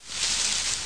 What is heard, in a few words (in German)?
Zweig; Loewe1